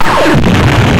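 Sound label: crash scratch; Perc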